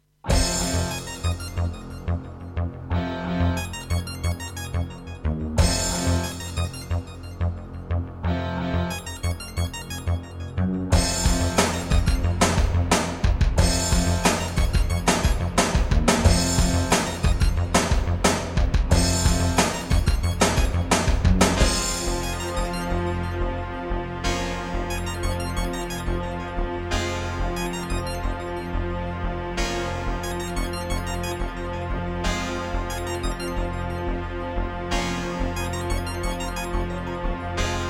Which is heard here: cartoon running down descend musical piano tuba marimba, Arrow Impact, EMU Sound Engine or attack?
EMU Sound Engine